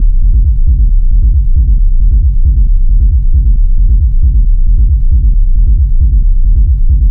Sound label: Bass Loop E 135, Techno; Ghosthack; AC2024 - Day 1 - Techno Sounds 2025